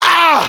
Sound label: pain sharp, fr; Demoman; Douleur aiguë 4